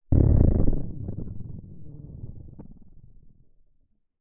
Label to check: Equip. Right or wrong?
Wrong